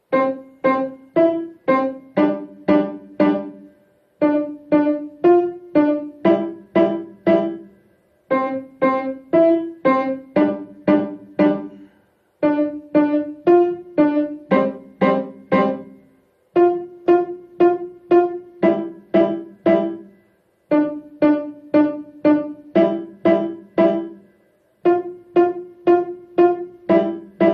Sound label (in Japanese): マーチングバンド